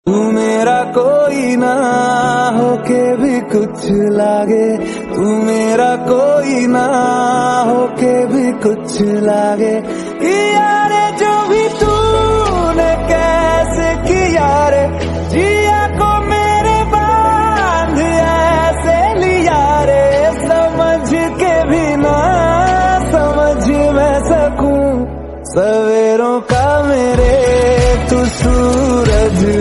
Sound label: hindi cute love ringtone heartbeat love